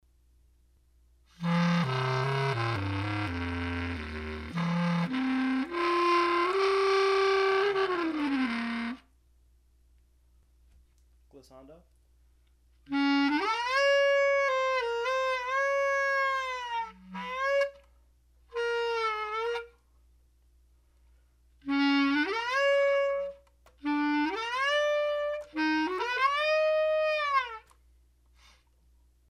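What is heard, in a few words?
flutter tongue Portamento Bass Clarinet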